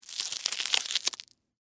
Sticky Rubber, Texture, Sticky, Short, Rubber, Gloves, Movement; Cleaning